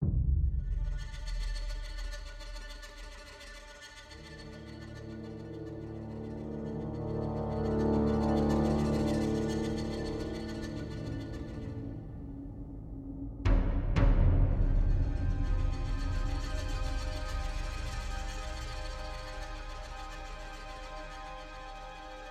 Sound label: Ambient Idea Nonloop